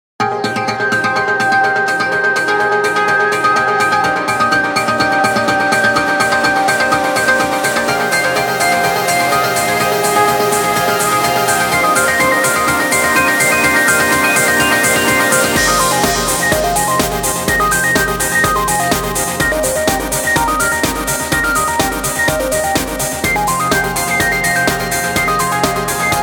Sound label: Neuron pulse